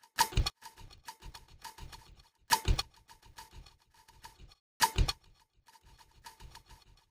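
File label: drum, separated component 5; Separation of Sound Sources by Convolutive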